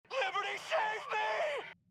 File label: radio static; liberty save me